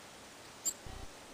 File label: hummingbird chirp